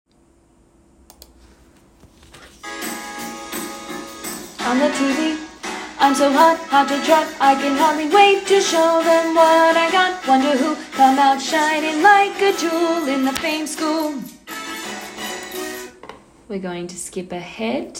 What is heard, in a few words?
Tenor; Fame The Musical - Holiday Pack